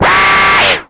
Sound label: BEAST; Masterbits - Total Zone